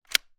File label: weapon gun ruger 10.22; still north media